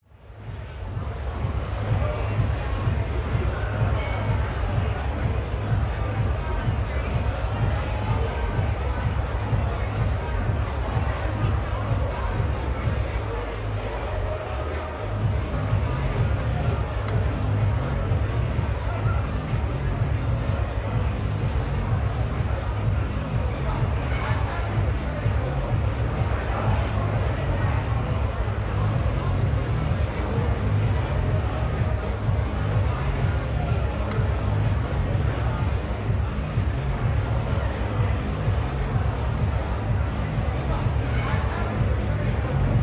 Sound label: club inside